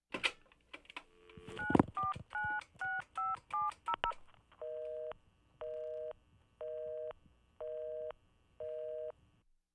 Im Buero Telefon Waehlen Besetztzeichen

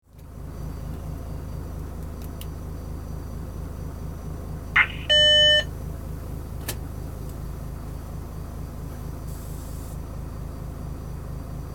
Fahrbeginn Abmeldung Fahrgeraeusche innen

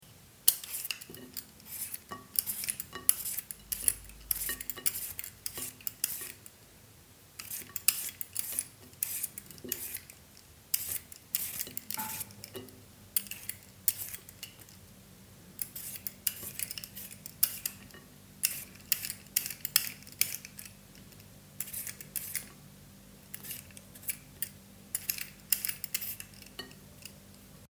Kartoffel schaelen

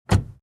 Auto Tuer

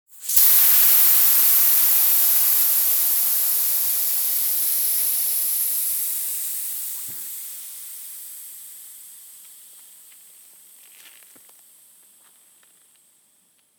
Luft aus Fahrradschlauch

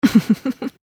lachen weiblich

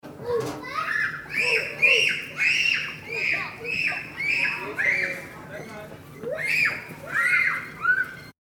spielende Kinder

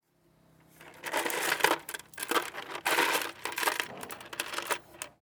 Schrauben

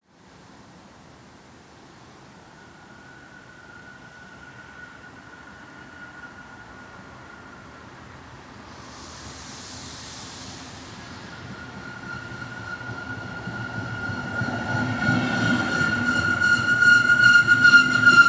Bahn Regional Einfahrt

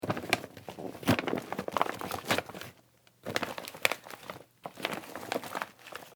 Papiertasche durchwuehlen

